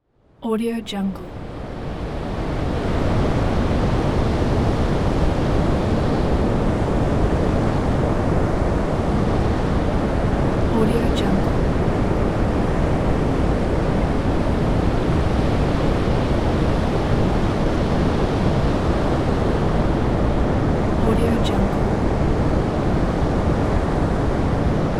breeze

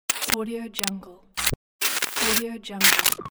glitch; audiomechanica2

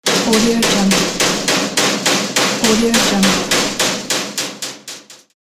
hammer

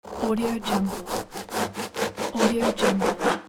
slicing bread and baked goods with knife

